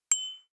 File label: Crystal